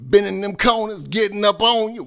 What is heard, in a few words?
Rap Vox